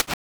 Retro FootStep Mud

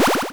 powerup, 8-Bit Sounds

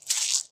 noise

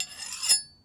Big Knife Scrape, kitchen knives; Micro Pack

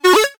power up; retro synth SFX

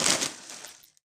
breaking; breaking falling hit sfx

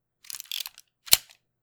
wind

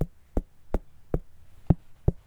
Knock the Melon; Micro Pack

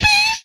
Sci Fi Gun, retro arcade; Guns; MMRetroArcadeSoundsPack

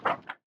paper

